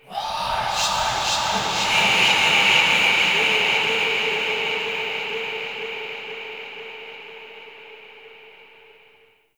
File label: Spirit Tree Holy Chatters; Ambience; One Offs; Glades Of Andurin